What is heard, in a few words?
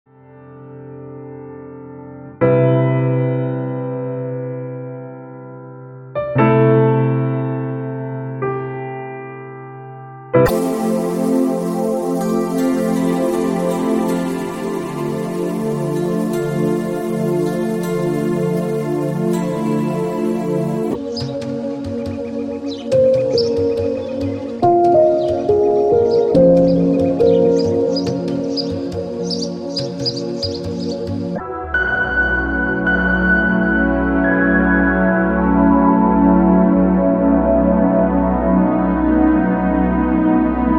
Natural Instrumentals; spas; Earth Elements